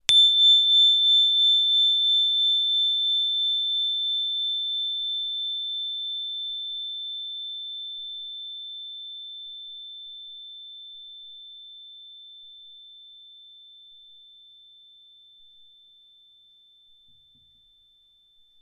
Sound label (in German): Venuston